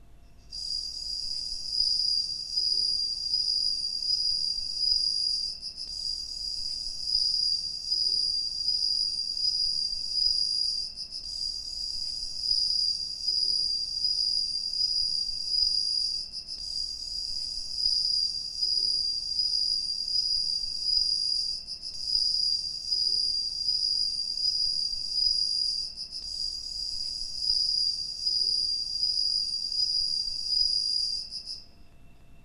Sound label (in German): Grillen